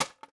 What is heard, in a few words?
wood hit; teardown; ps5 windows xbox series xs